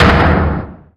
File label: drama pound